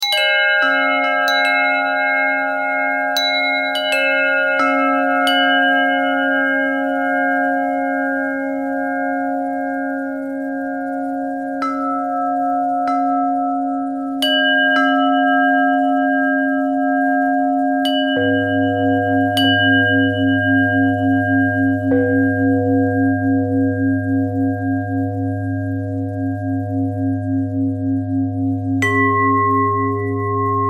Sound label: bell thread simple